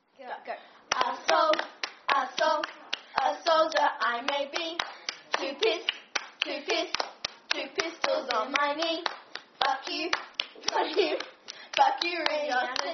Clapping, A Soldier I Will Be; Asshole Asshole

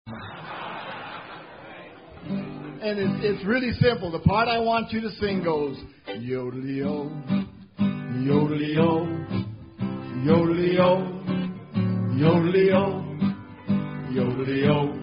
Yodel-E-O

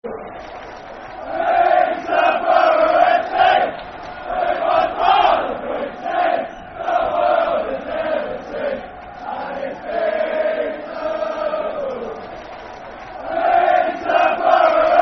Football Chant, Soccer Song; Peterborough F.c.; Peterborough United Pufc

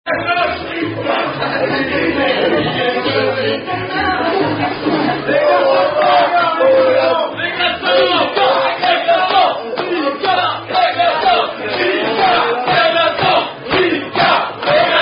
Unknown French Chant; Lapin Lapin

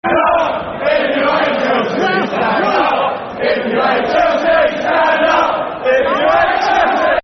Football Chants (Soccer Song); Stand Up If You Hate Chelsea; Tottenham Hotspur Thfc

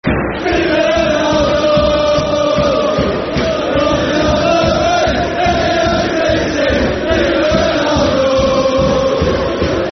Football Chant (Soccer Song), Man United; Viva Ronaldo (Ringtone (Short) Version)

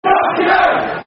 Knock Him Out, Football Chants; Mowbray Knock Him Out; West Bromwich Albion Wba; fanchants

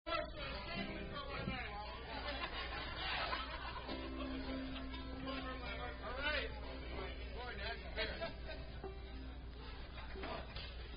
Dud's Drunken Pipe (Instrumental)

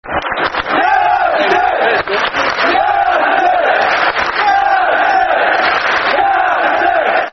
United Solo Start Football Chant (Soccer Song); Man United

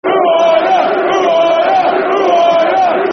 Who Are Ya, Football Chants; fanchants; Ipswich Town Itfc

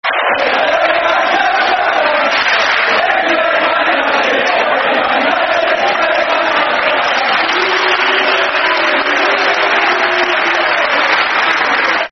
Football Chants (Soccer Songs); If You Hate Man United; Man City Mcfc

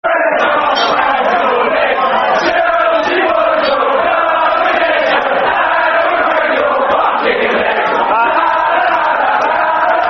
Football Chants, Chelsea; Chelsea Boys Are Gonna Get Ya; Chelsea Cfc

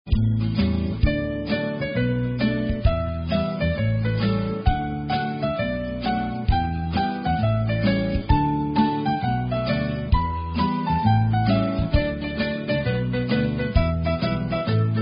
Instrumental; Girl Scouts

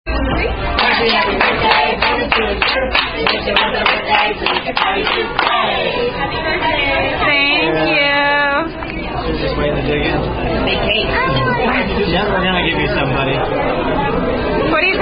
Birthday, Happy Happy Birthday; Chilis

